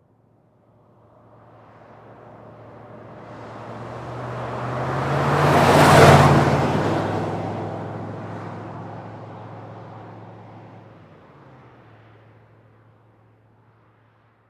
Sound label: Expedition 30 Mph By, ford expedition